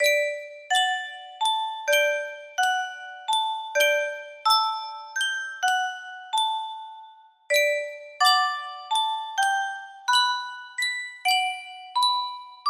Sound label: custom tune music box, music box melody